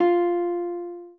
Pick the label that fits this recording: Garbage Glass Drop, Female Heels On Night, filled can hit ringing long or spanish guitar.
spanish guitar